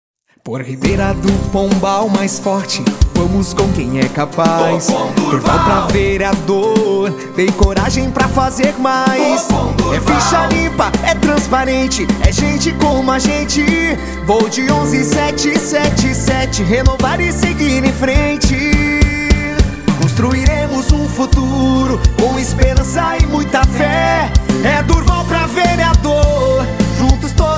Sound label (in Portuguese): jingles politicos